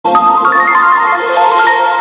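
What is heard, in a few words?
funny SMS melody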